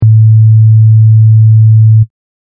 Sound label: sine